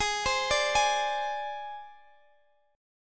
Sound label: G sharp Major Flat 5th